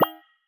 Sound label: sound notification